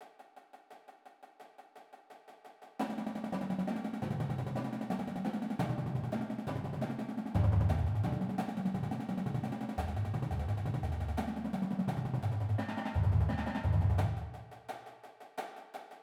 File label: Paradiddles, diddles rolls